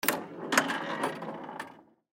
Pinball Ball Hit, Pinball Ball Hit By Plunger Roll Up Side; Arcade; Arcades; SoundEffects-Blastwave; Sports